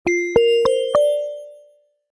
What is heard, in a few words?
Fade In, notifications; Itel it1406